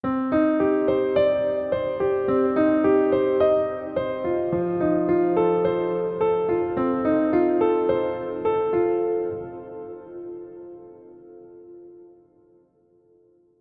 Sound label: Cinematic Grand Piano In Hall